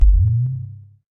ui alert

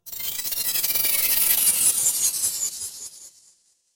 magic scifi spell

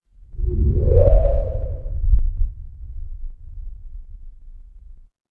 horror wind whisper

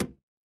impact metal hit

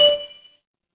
ui chime

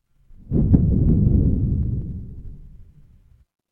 impact explosion

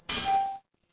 ui chime

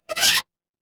cartoon squeak

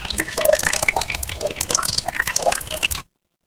water drip

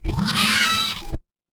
mechanical servo

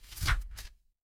paper fabric page turn

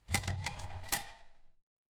door chest open

